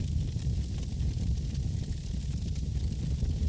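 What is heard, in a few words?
Lantern Flame Loop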